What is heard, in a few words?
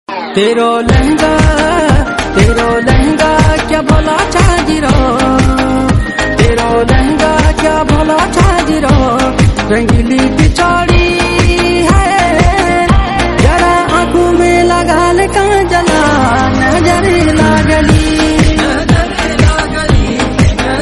Ringtone